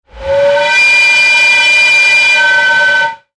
sound design air pipe pressure, Pressurized Air Release; Pressurized Air Or Steam Release From Pipe; Hisses